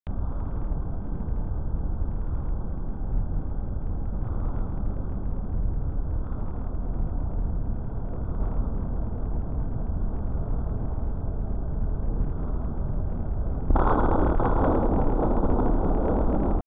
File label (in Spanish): SONIDO REAL, explosion; Leganes; Analisis explosion Leganes; Investigación